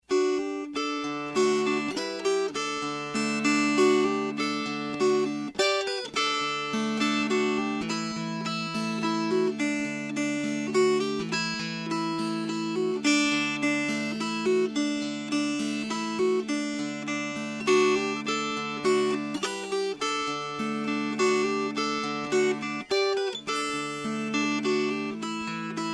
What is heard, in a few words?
Fingerpicked; Fa la nana, bambin